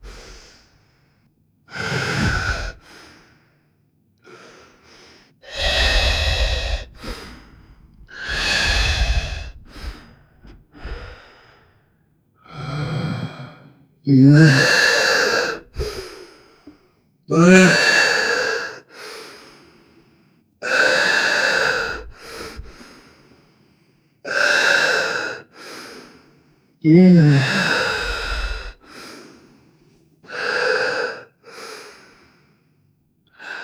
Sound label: 18 years old man groaning